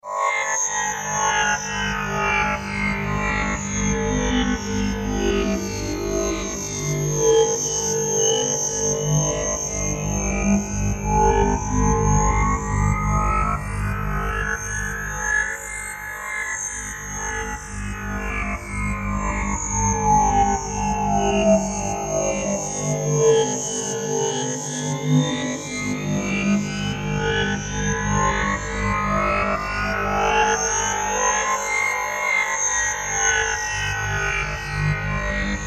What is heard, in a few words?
sound design texture soundscape scanners, Dark Texture/Soundscape; Sound Design - Dark Texture / Soundscape With An Electronic Scanning / Filtering Effect Ru; Strange Tones; McKinney Sound